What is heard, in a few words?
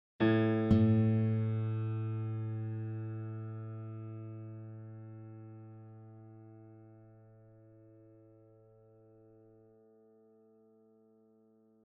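Piano Guitar In Tune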